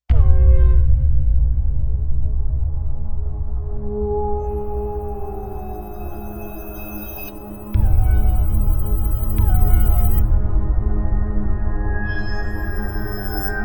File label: ALIEN SKY; Digital Synthesizer